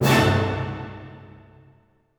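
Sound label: orchestra; Masterbits - Switch FX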